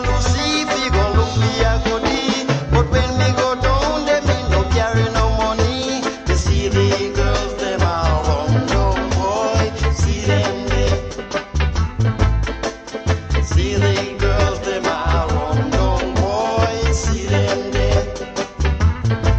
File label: Reggae